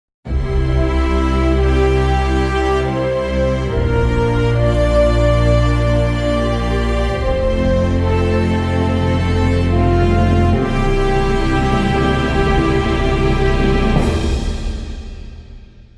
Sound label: stinger; musicfox